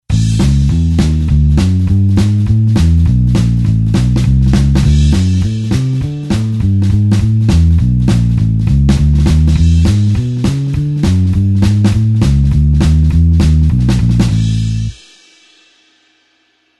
blues bassline 4beat A

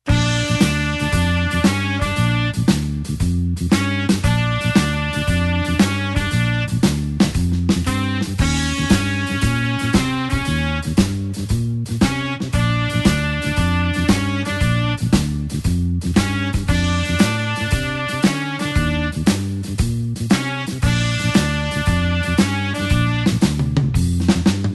blues horns shuffle Bb

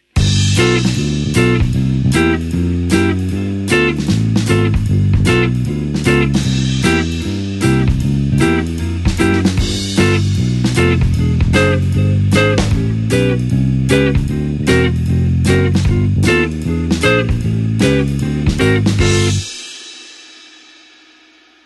swing 5 4 on the floor high position

